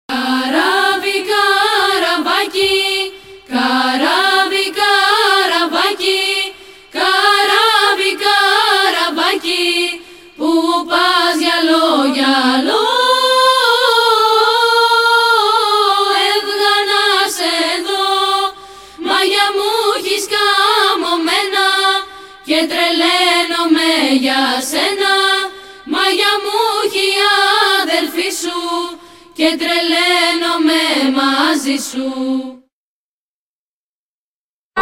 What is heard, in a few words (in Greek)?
Καράβι καραβάκι Δημοτικό Προποντίδας